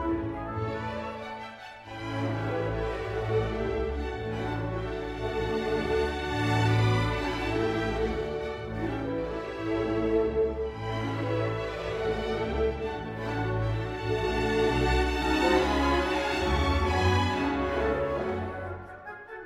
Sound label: Easy Sightreading, violin excerpts